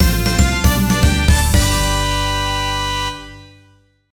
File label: Jingle CLEAR; Sudoku + 7 Other Complex Puzzles by Nikoli; Nikoli no Sudoku 3D: 8-tsu no Puzzle de 1000-mon